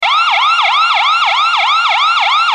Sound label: police